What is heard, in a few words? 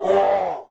screams human